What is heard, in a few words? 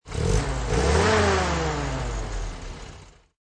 Revs; disney; cars; Sarge